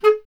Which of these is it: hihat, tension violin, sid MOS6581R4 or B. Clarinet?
B. Clarinet